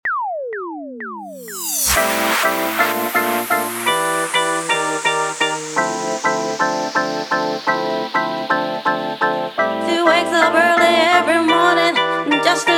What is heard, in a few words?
intro outro 126 bpm